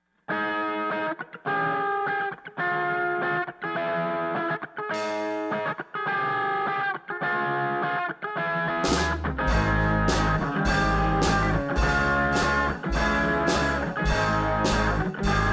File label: less Gain scratch Mix